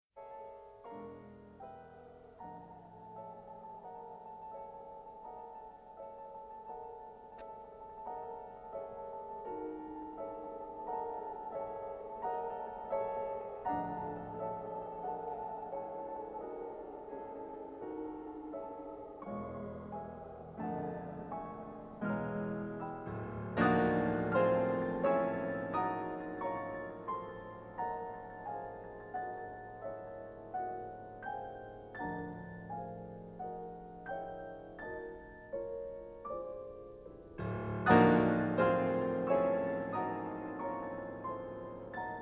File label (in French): piano flottant